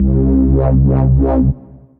Madness Wobble Bass, Synth Bass; Ableton; Factory Packs; Beat Tools

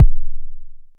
analog attacked, Kickbank; Ableton Foundation FreePacks

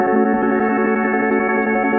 Grain Stretch; Inspired by Nature by Dillon Bastan; Vector Grain Presets

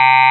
android, Digital Synths

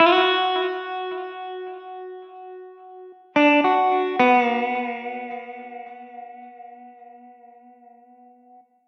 Guitar Chill Bm 108bpm; Guitar and Bass; Ableton; Factory Packs

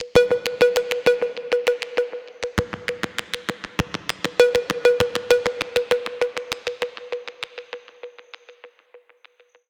Bell Delay 99 bpm; FX; Tonal; MIDI Clips; Drive and Glow; Ableton; Factory Packs

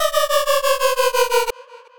Pulse Descend, Effects

